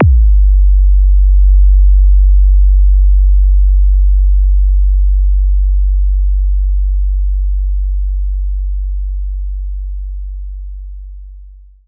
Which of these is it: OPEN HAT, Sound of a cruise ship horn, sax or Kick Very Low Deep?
Kick Very Low Deep